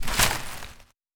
Forest

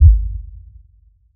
Sub Impact

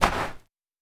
Snow

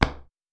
Cement

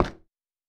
Cement

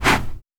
Sand gravel

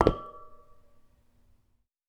Metal

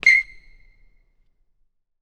Basketball Court

